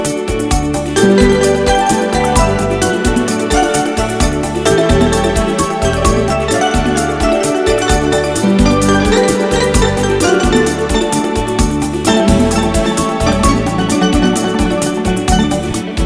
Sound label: Beautiful and Happy